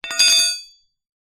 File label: impact metal dowel thin place down on concrete